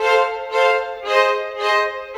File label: Violins; Sonic Foundry (Sony Creative Software); Orchestral Series VOL-4; Rock and Pop; Rock-Pop 13